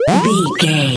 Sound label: retro powerup collect